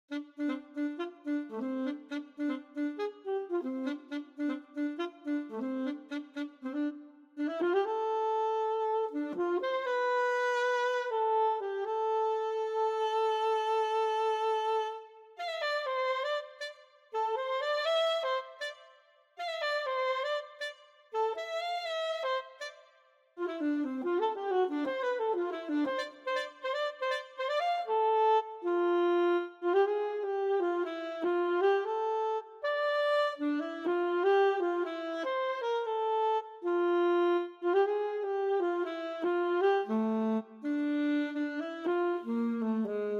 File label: Saxophone Alto